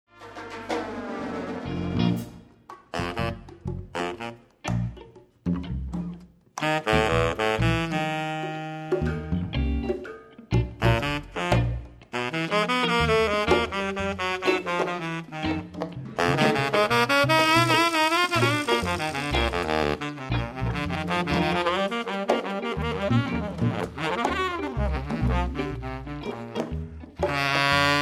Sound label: Reggae Bari Solo